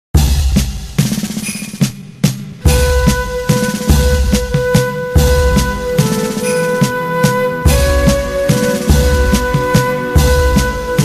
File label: instrumental bikalam